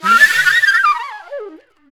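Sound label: flutter, flute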